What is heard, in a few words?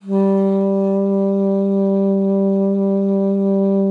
G3, saxophone; Best Service ProSamples vol.42 - Session Instruments